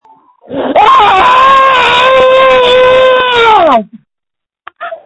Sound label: Scream